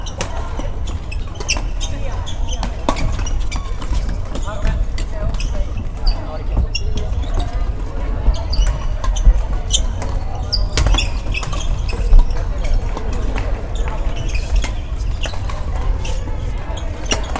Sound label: badminton; sports